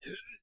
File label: Monster Strain